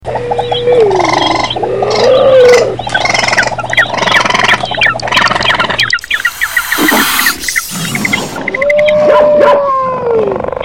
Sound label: The Sounds of Animals